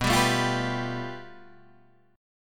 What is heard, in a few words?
A 7th Suspended 2nd Sharp 5th